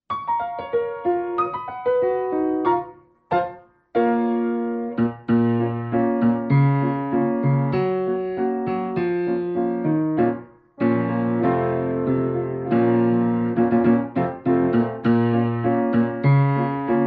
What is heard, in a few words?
instrumental